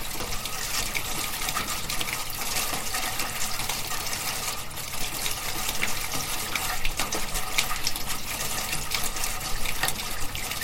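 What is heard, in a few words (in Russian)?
водосточная труба по которой стекает вода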